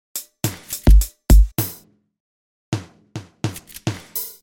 HipHop Missing Kick

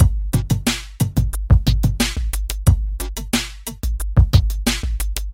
Hip Hop Drums

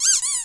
Dog Chew Toy

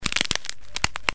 Bone Cracking

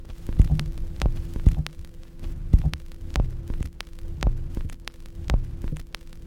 Old Record Sound

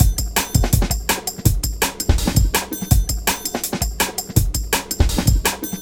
Hype DnB

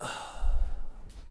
Male Sigh Heavy

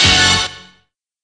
Hip Hop One Hit

